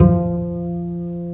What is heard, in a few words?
Cb Pizzicato; STR; Roland L-CD702; Cb Pizz 1